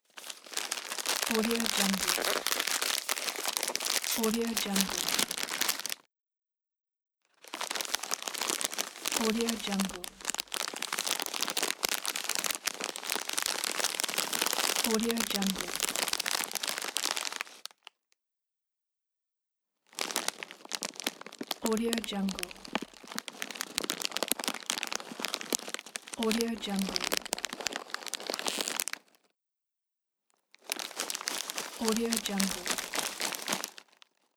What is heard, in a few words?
opening a bag of snacks